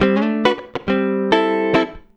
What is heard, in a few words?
104 FUNKY, Electric & Acoustic Guitar Loops